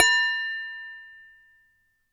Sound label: Damped Hit, Glass, Large Wine Glass